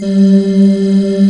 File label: ghostie; cubic